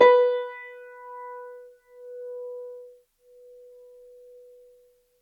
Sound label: Grand Piano, Kawai, Sustains, B3; Chordophones; Legacy